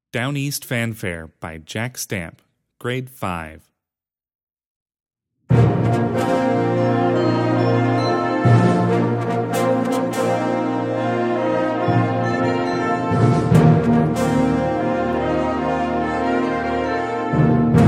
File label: Fanfare